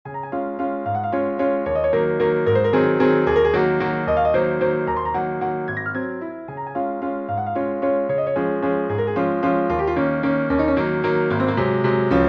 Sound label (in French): valse